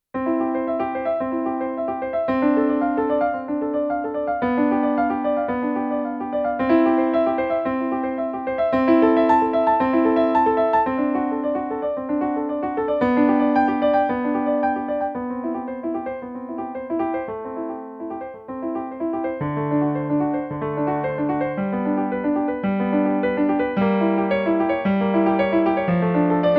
bach well tempered clavier prelude no 1 in c major